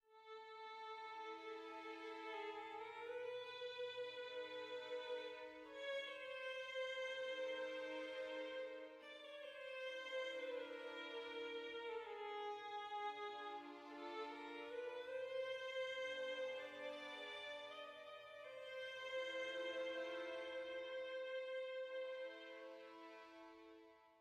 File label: dark soft; Quivr; PS4 Windows gamerip 2016